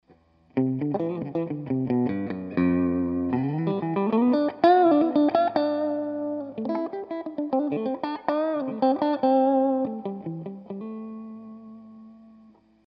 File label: walnut neck middle pickup